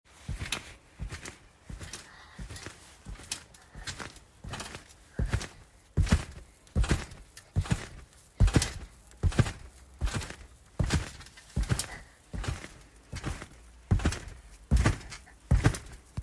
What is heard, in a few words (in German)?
Trampolin springen